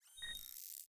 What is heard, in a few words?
Windows Feed Discovered